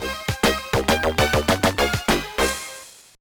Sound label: Death; thats so raven 2 supernatural style; gba